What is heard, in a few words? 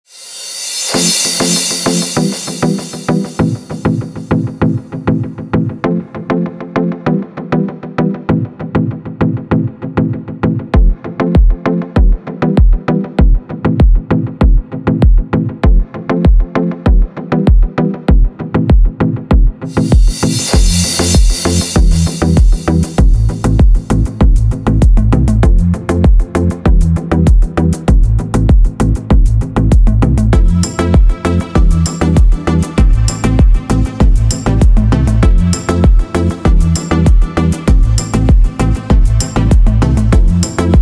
Summer inspiration; Mantra Studio